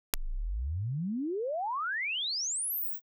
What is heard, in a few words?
sweep -30dB